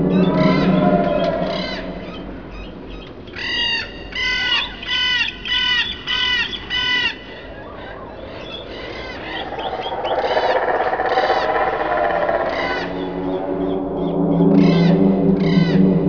MYSTERIOUS AMBIENCE

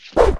WOOSH

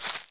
step earth

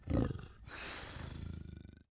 ORC AMBIENT

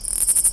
WALK

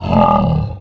hurt; mahlendur

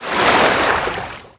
SWIM BACKWARDS

